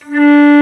Flute p D2; Digital Sound Factory Virtuoso

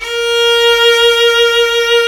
VIOLIN A#3

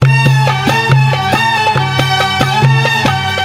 Oiental; Best Service; Ethnic Journey Sound Cube

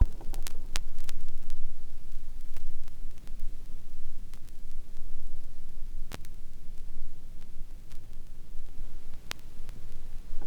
vinyl; Masterbits; Vinyl Attack

